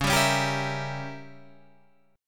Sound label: C sharp Diminished 7th, C sharp, Guitar, Open G; down; chord shape